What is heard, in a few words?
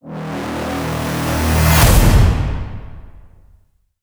whoosh impact; Bluezone; Cinematic Impact Sound Effects